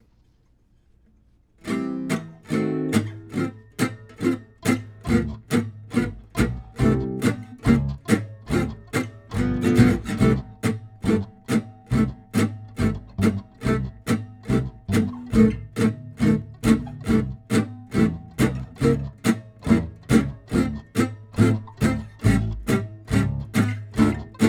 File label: rhythm track for solos